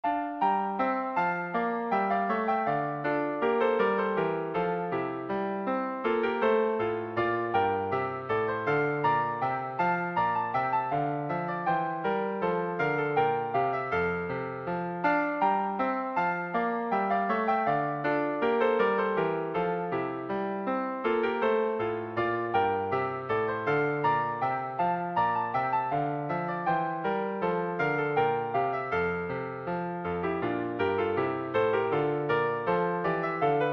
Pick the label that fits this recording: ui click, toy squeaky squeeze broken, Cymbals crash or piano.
piano